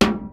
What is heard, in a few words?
KICK SNARE; Kid Nepro; Elite; KICKS SNARES